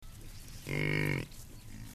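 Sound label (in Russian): звук сытого буйвола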